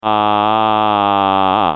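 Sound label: pyro pain critical death; johnmadden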